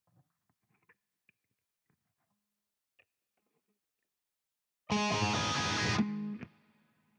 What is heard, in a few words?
Guitar